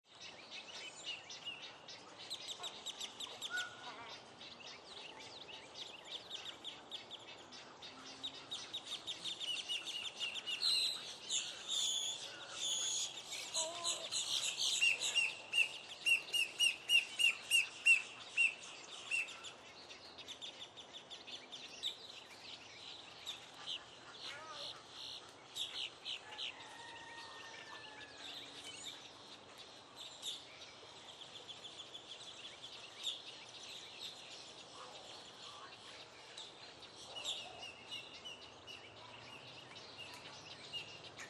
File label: Marsh Ambience Florida Morning; Moderately active marsh or swamp ambience, morning. Bright, cheerf; Environmental Sound Effects